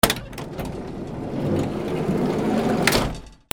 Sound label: CARS